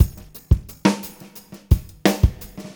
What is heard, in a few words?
Beat Loops; Zero-G; Monster Beats